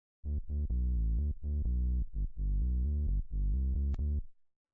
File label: Bright, Rheem combo organ